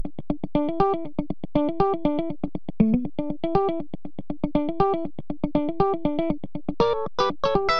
wet guitar funk; honeybee; Morphdrive

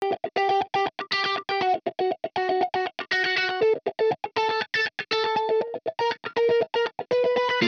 dry amp guitar flanger; Morphdrive; Demos for hybrid configurations

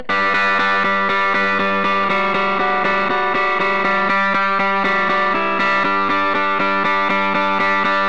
wet guitar distorted; Morphdrive; Demos for hybrid configurations

